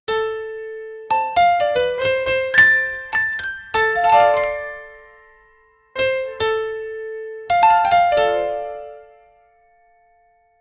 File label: create a cute piano jingl, jingles